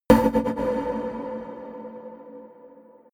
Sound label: energized fuse; whooshes-rises; hits-impacts